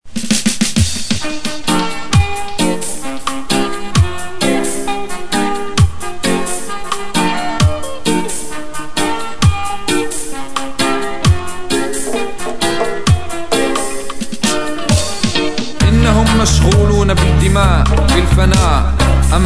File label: drumbeat